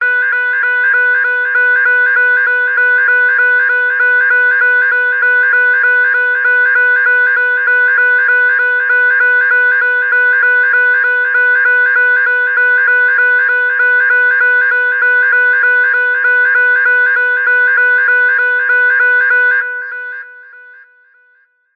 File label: science fiction alarm beeps emergency annoying tone